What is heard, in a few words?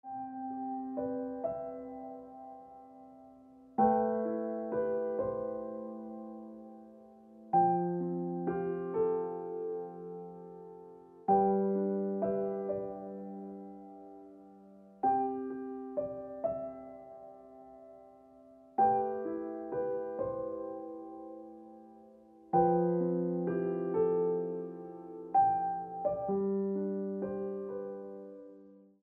Ringtone Piano Music